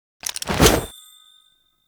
Grenade Throw; Warframe; The New War; Kahl; Abilities

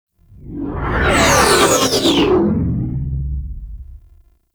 Ship Close Fly By; Space Fighter; Warframe; RailJack; Enemy Ships; Sentient; Coil Agent

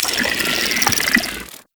infested fishing fish caught; Weapons; Infested Fishing Spear

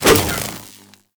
Prod Whoosh; Warframe; The New War; Drifter

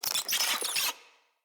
Infested Ashen Vocal Pain; Enemies

